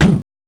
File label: BD